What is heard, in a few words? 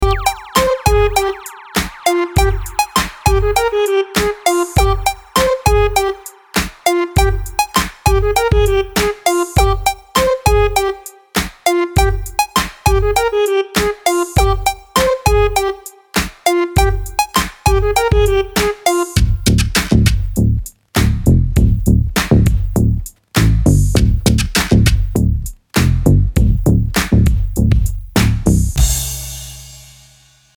Funk; LatinNeo Soul & hip hop